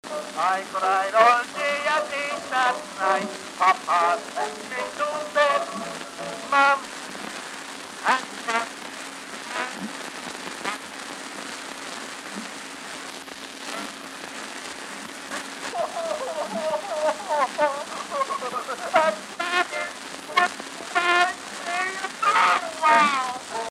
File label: Bow Wow